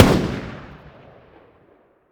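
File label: weapon ar fire player atmo exterior; gmod; viper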